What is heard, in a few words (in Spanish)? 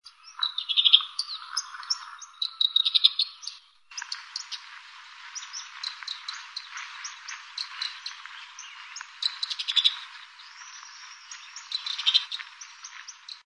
voz tijereta